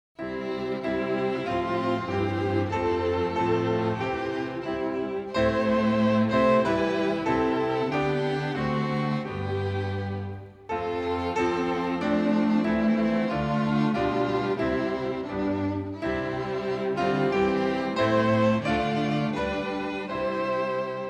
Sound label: hymn instrumental